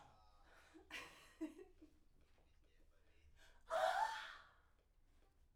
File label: Backs SCHOEPS